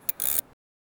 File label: bike gear sound; bicycle